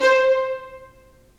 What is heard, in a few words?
STR Violins Marc; Roland LCDP13